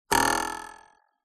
cartoon ruler twang metal

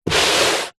shoe sneaker scuff carpet; foley; zapsplat

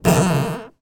foley plastic grip hand hard movements creaks; zapsplat

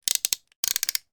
toy ratchet wind

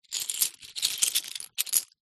shells small handling; foley

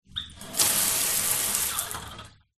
household shower on off very quick burst; zapsplat

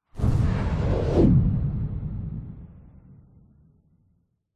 vehicles plane fighter jet sonic boom designed

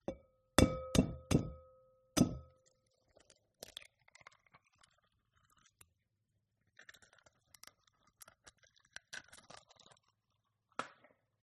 eggs crack bowl

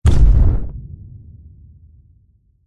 sound design thud hit punchy slam dull; zapsplat

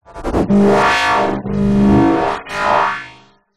sound design fm electricity surge power; zapsplat

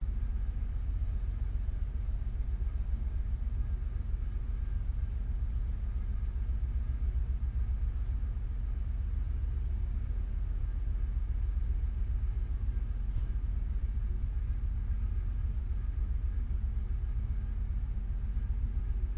canadian ferry departing on board; your free sounds

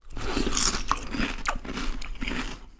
human eating cereal with milk

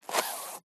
Cane Umbrella Large Clasp Fabric Movement Tighten

